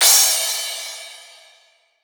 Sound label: Crash, Drums Cymbal